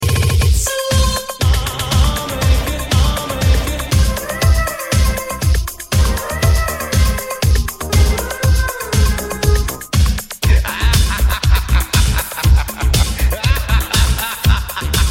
tone

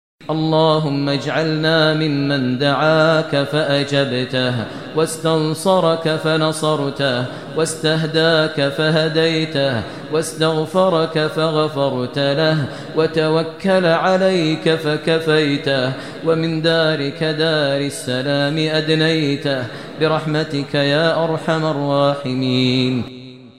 Doaa

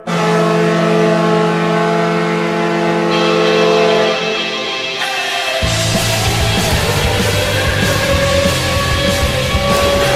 Goal; NJ Devils

